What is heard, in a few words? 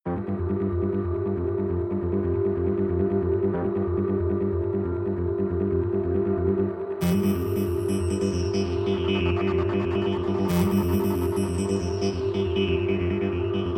drum driving pads and pulses